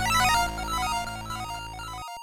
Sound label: 8Bit; DPPt